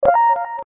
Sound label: Missed; Motorola PEBL U5 Prototype